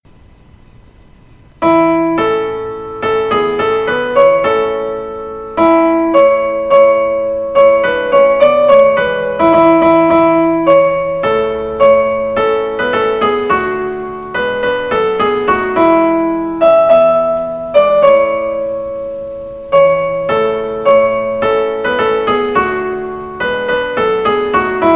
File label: piano soprano